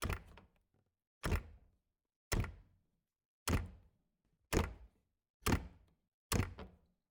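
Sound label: Refrigerator, old fashioned, wooden, steel, brass clasps, hinges, open, various; Eiravaein Works; Vaeyan IV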